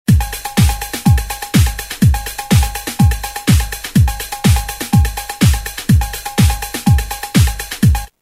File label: 808 Cow Bl 124; Roland M-DC1